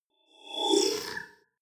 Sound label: flyby splutter